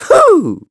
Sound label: Jump; Stritch jumping into the Sky; zelda sounds; Skyward Sword